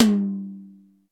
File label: ambient tome drum sample g key, Royality free tom single hit tuned to the G note; tom drum samples